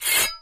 SWORD SHING, swords

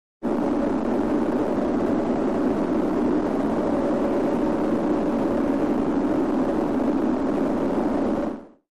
Noise Angry Bee Swarm Drone with Distortion

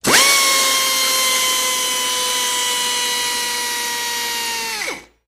Saw Air Tool Epiderm, saws

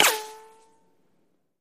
Bullet Ricochet Sin

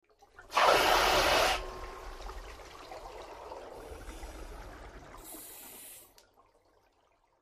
Big Air Release Bubbl; bubbles

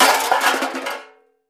Junk Thrown Junk Pile, junk crashes; Junk Thrown Onto Junk Pile

